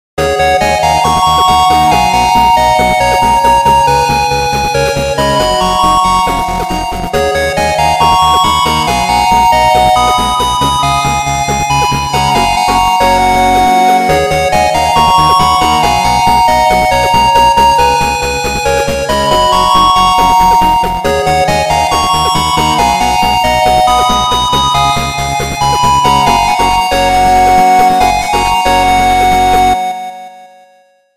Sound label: chip